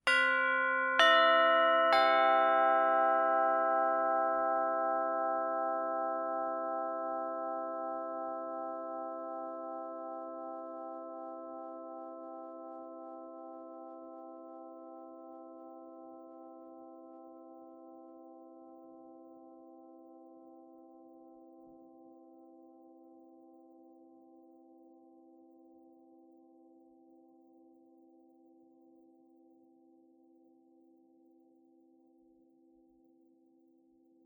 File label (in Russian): do-mi-sol